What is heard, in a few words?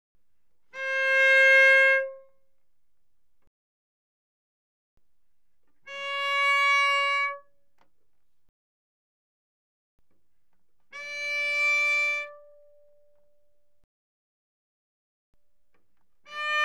Cello arco mf sul A C5Ab5; Strings